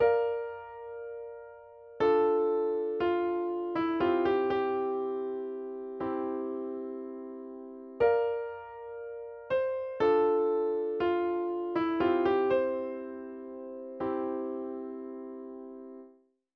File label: instrumental C